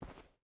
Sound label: footstep rubber boots cloth walk; Rust